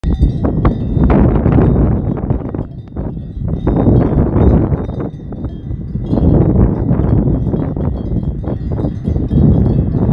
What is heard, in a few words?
Sheep